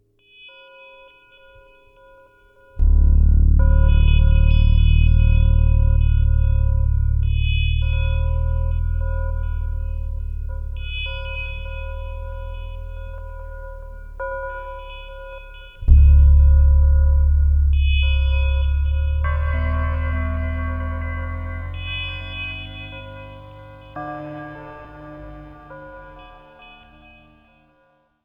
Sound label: Dark Cracked Bells with Bass